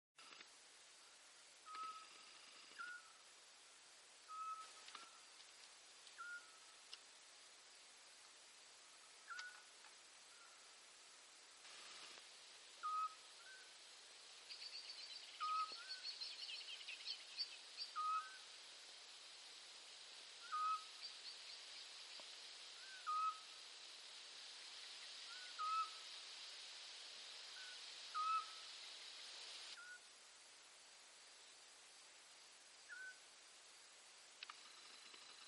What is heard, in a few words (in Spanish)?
Autillo europeo Otus scops